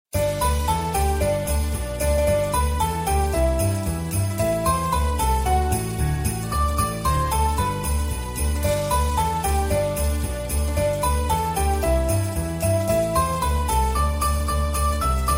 upbeat; Jingle Bells; navidad ambiental

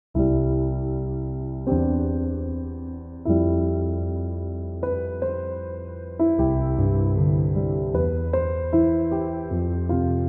lullaby sleep piano instrumental; from “Barbie”

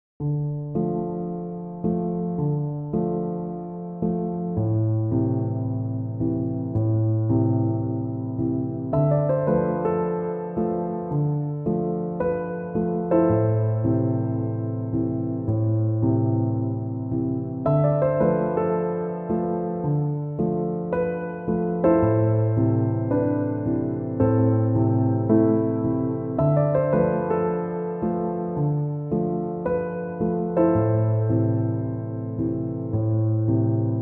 piano instrumental

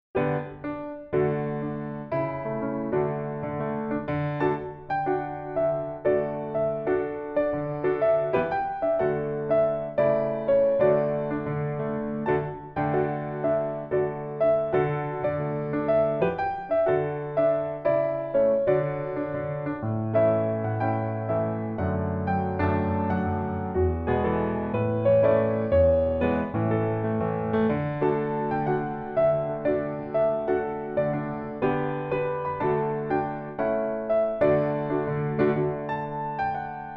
Deck the Halls (Instrumental), Piano; Navidad

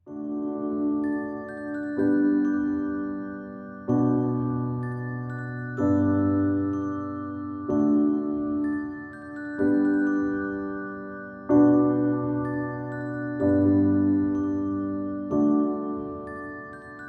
Piano Relaxation

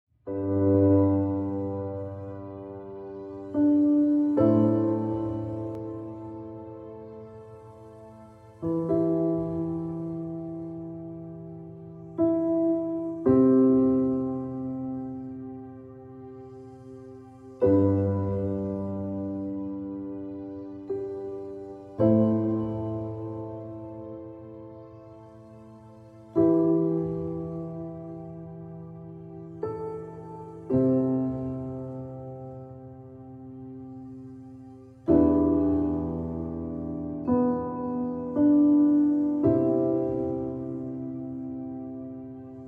Piano Relaxation, Spa

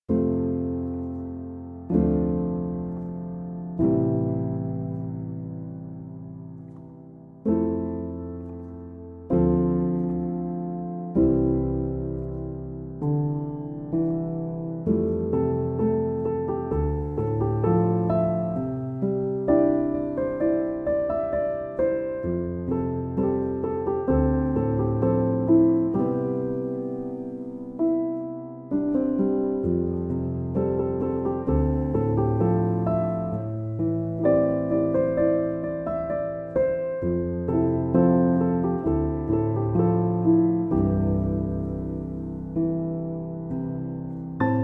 piano instrumental

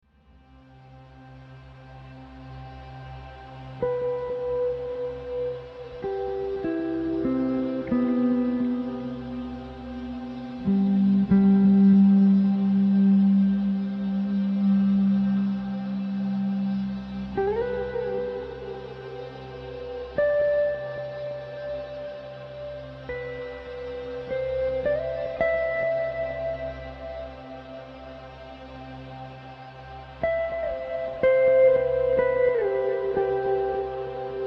Peaceful Music For Relaxation And Wellness; hotusa spa

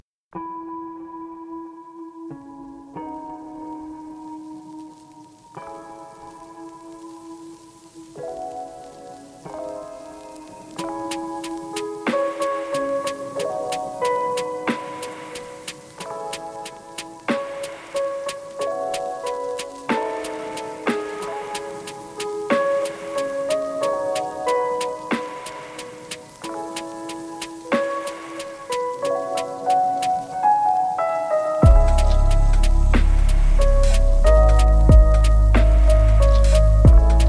instrumental lofi

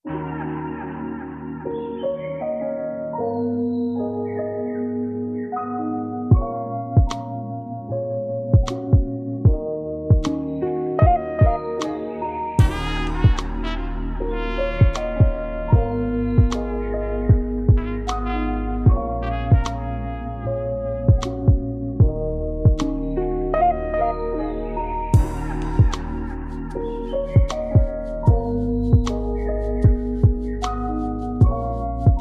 Jazzy Tune; LUXURY AMBIENT

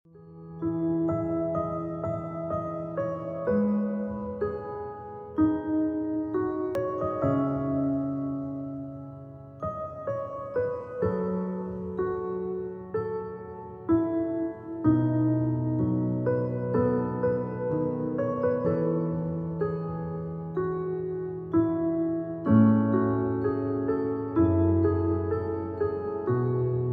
Piano Relaxation

